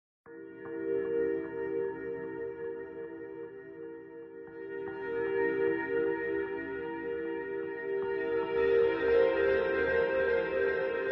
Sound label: No High Strings